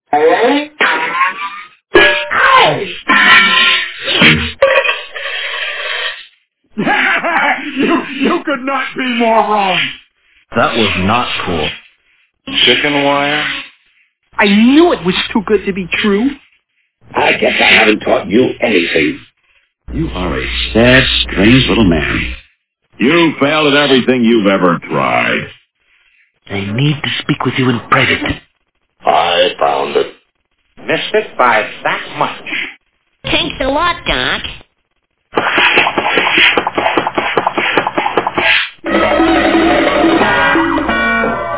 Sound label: check engine